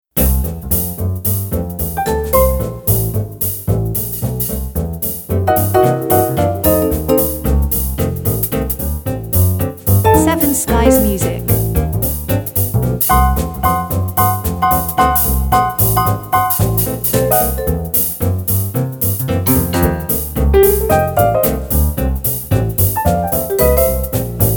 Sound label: Bebop Traditional